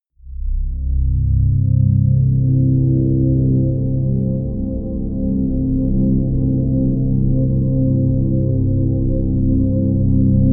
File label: drone subtle undulation